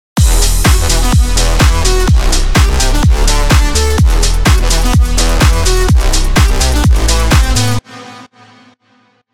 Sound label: Full Crash